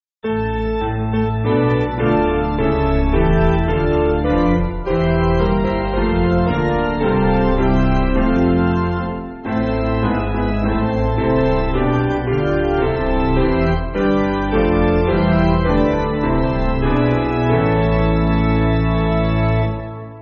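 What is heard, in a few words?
Organ Piano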